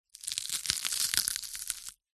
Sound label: horror bone break hand stand on crush